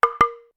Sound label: heavy wood drop